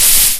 cool lava; nalc game

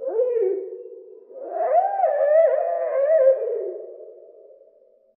exotic creature song; ambience; dfcaverns

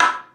noteblock sticks; mesecons

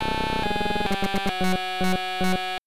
fail point; server-nalc

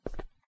bedrock step; server-nalc

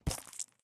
mobs slimes death; mobs redo

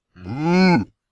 minothaur war cry; server-nalc; mobsounds

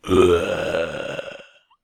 yeti death; server-nalc; mobs

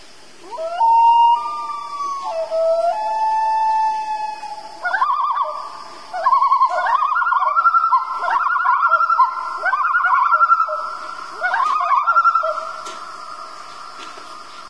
canadian loon; ambience

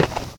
metal footstep; minetestforfun game

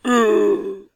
male NPC death; server-nalc

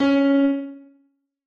noteblock c sharp 2; mesecons

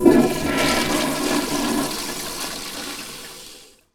toilet flush; homedecor modpack

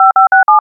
digicode; scifi nodes

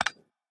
craft; craftguide

